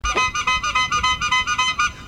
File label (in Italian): trombette